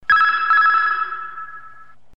plim plim; globo

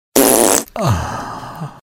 peido e alivio

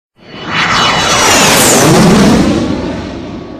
barulho de aviao

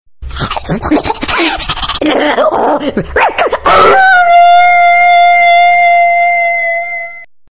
lobo maluco uivando

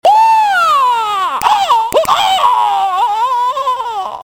grito; Liu kang

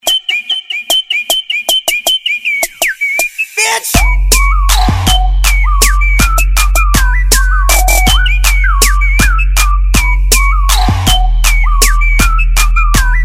toque som de passarinho; toques para celular; cantando remix para celular

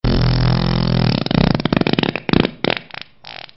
Peido Molhado

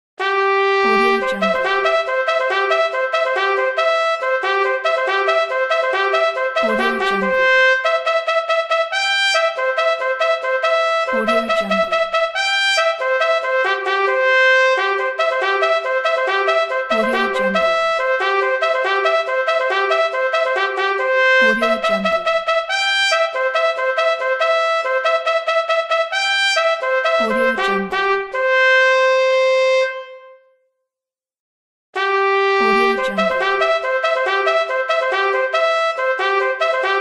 som de trompete militar

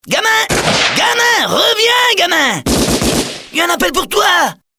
sons de tiros

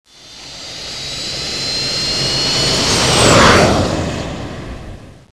Jato passando

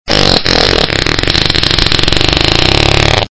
peido longo ringtone

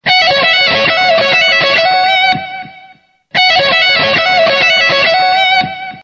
solo de guitarra agitada

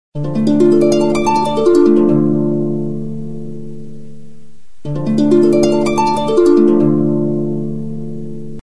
toque para celular harpa iphone

